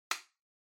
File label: Portable Radio Cover Open